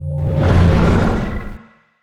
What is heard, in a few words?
monster; Return of Legends; mir2